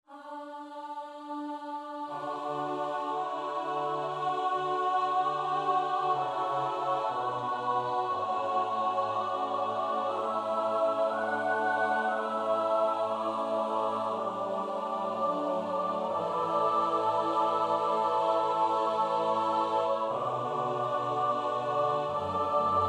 amen, choir

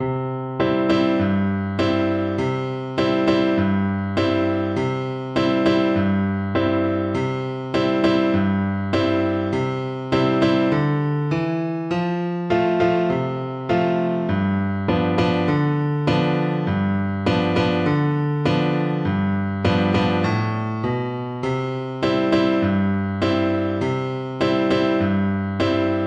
Oboe

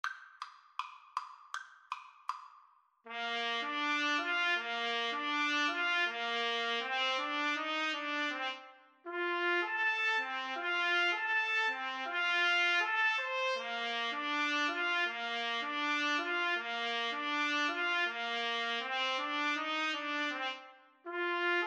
trumpet duet

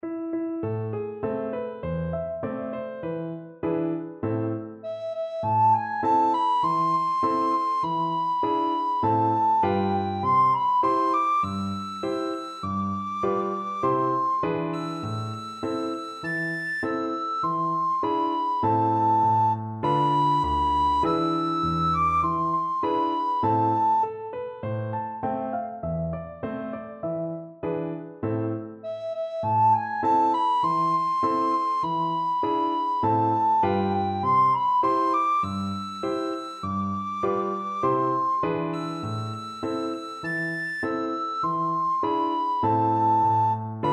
achtsik er recorder

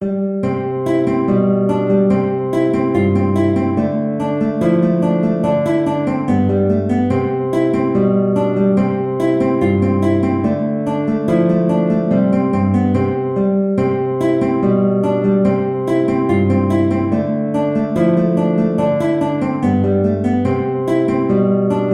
Reel, guitar; Black Cat

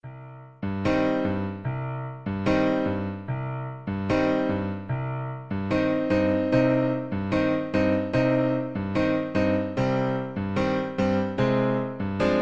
habanera, Trombone

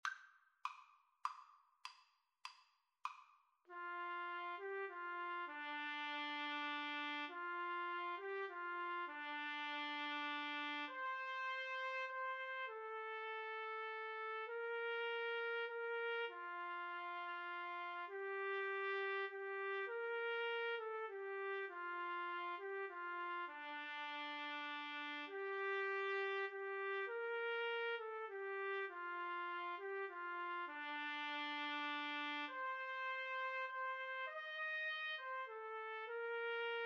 silent night trumpet duet

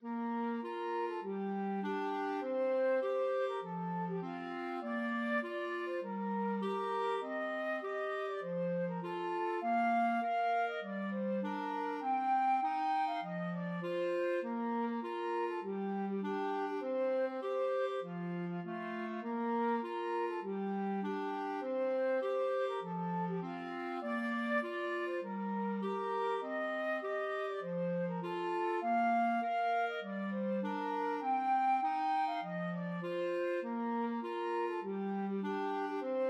i love the mountains, flute clarinet duet

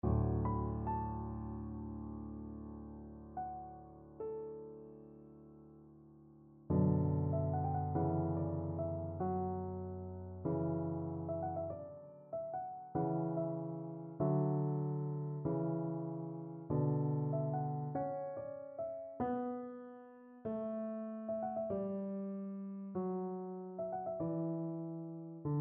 norwegian folk tunes, piano

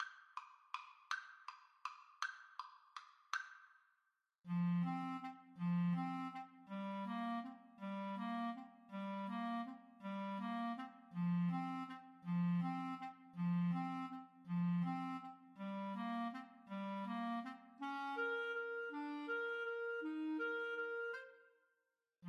waltz, Clarinet Duet

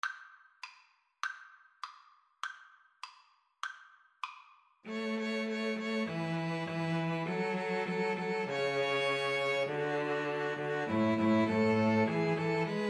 old st nic, String trio

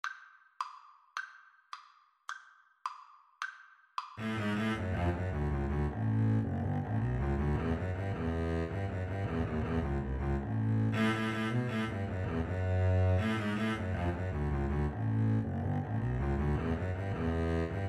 Double Bass Duet

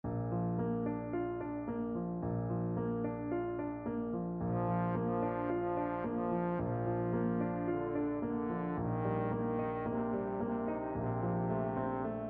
trombone

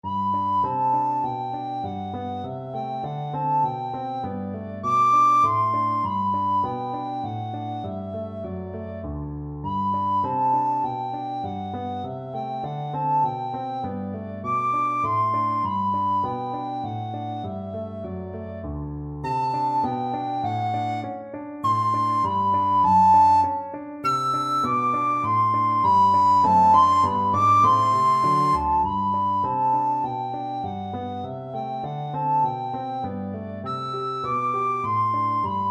melody, recorder; schumann

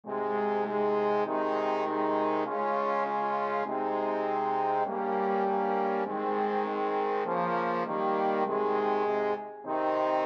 twinkle twinkle, trombone quartet

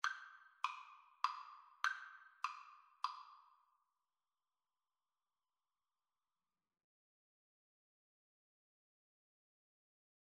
violin cello duet, Violin-Cello Duet

